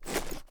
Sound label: sn reload arm; gmod; iw8; alpha50